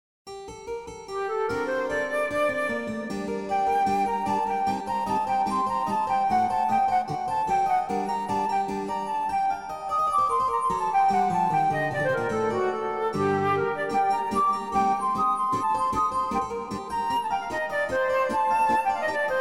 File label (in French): sonatine ancienne pour flute